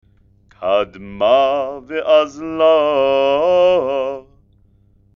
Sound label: haftarah, trope